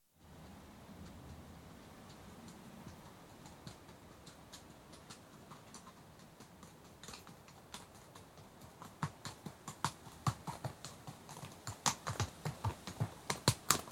One horse approaches at walk, stops, departs at trot, then walks right to left, some wind noise; Horse Sound Effects; BBC